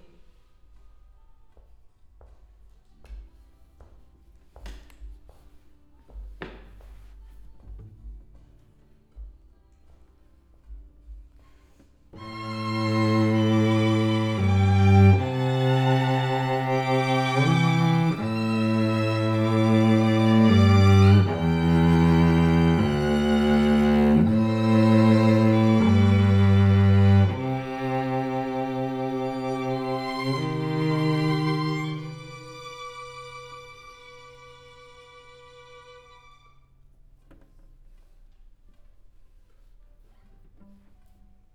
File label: Cello